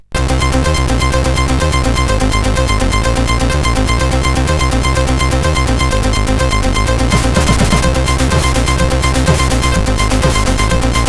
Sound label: Light Techno sid CSG8580R5